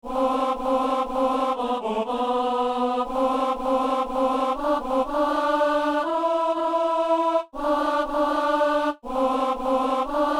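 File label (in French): ténor; Laudate Dominum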